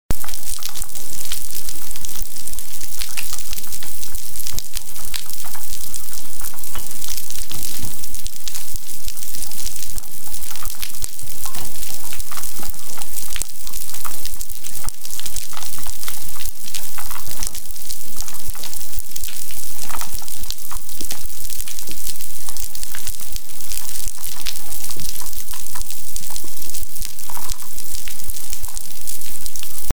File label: Underwater Sound Gulf Overnight